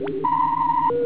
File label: transporter console